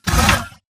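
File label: Stalker Movement Servo Heavy Short; Titanfall 2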